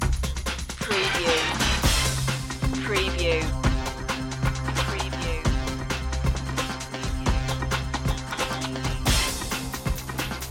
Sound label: funk head loop